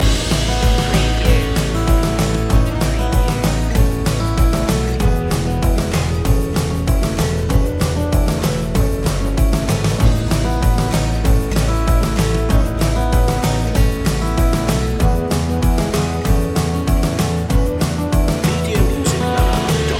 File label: midnight shuffle loop